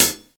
smooth hi hat sound B key, pedal hi-hat one shot tuned to the B note; hat samples